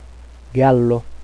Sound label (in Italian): gallo